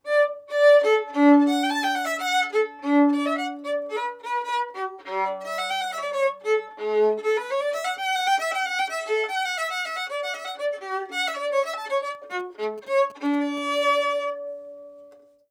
Baroque Bow Modern

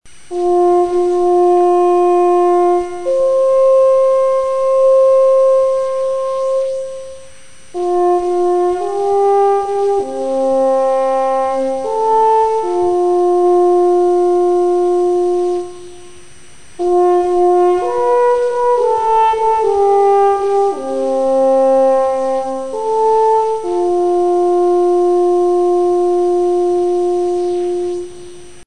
tenor horn; brass

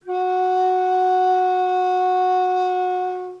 F sharp 4, flute classical

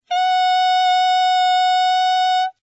G sharp 6, tenor saxophone

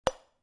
bellplate corner; bellplates

